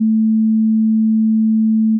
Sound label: sin osc